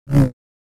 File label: Saber; FXHome